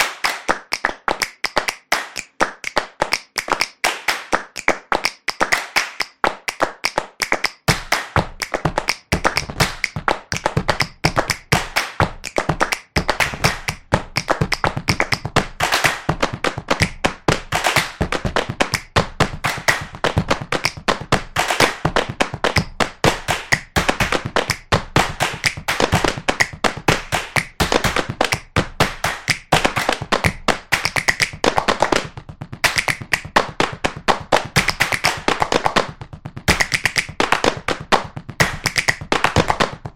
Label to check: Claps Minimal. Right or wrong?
Right